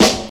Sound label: smooth acoustic snare G sharp key, acoustic snare sound tuned to the G# note; snare drum samples